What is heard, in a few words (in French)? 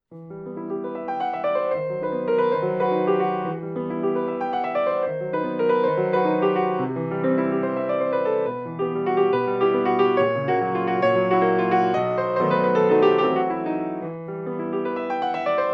Prélude en mi m piano; musique instrumentale